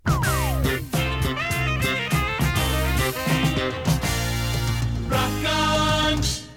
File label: topformat maxi jingle single